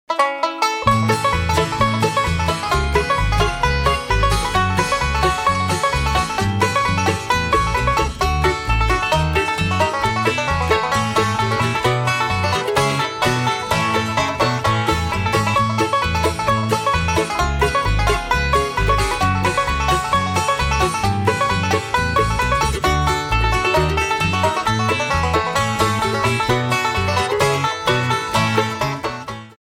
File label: bluegrass